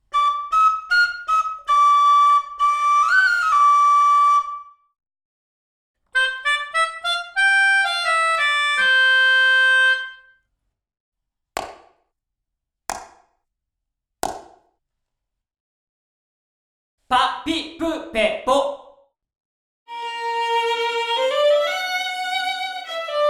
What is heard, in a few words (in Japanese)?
ライブ・ルーム：Studio 3, 最もタイトでドライ寄りな部屋; 比較的小規模で、最もタイトでドライ寄りな部屋。